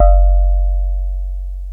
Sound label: DX PIANO